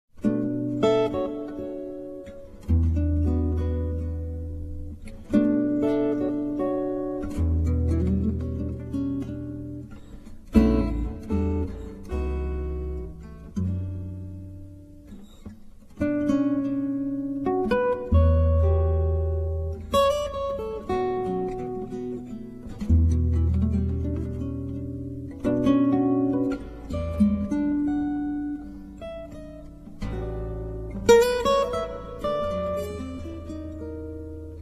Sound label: b minor waltz